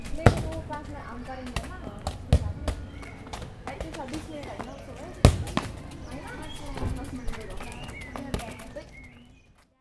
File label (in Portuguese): Jogo de bola